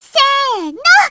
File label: reloading; nepgear